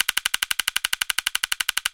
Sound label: counter loop; Magic Stars 5; Wazdan